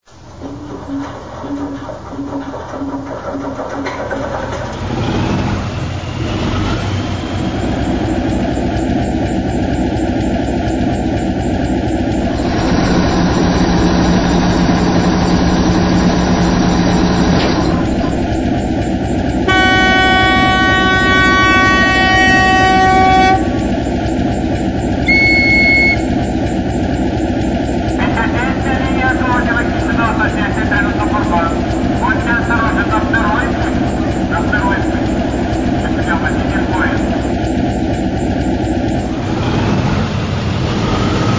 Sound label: Diesel D1